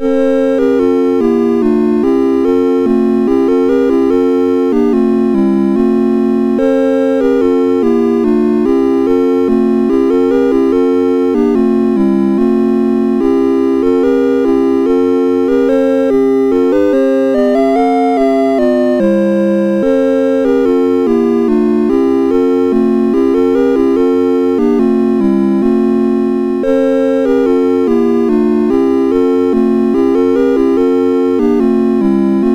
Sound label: Deck the Halls, MOS6581R4; Master Composer; Deck the Halls v2